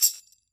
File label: TAMB, tambourines; EdgeSounds; Drum Mashines VOL-1